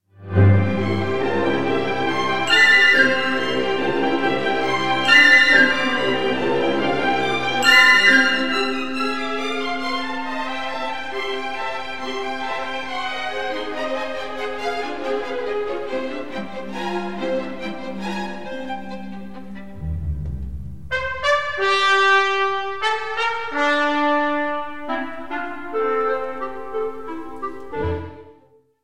symphony no 4 in g major for soprano and orchestra 2nd movement